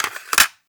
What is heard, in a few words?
Load Cassette